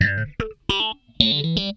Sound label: FAZE PLUK, Funk Guitar; 90 s Sample CDs; Zero G